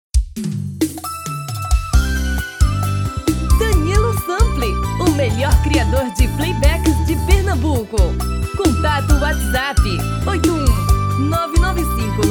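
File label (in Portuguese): Brega atual dueto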